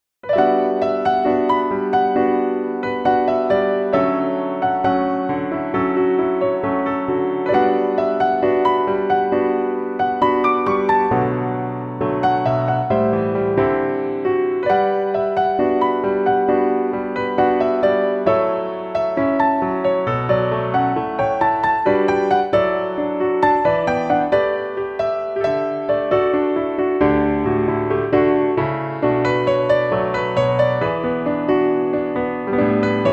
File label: instrumental ver.; Your Diary; sound diary CD